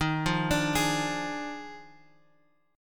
Eb Major 7th Suspended 2nd Suspended 4th, Guitar Open G; 13,10,12,13,x,x; down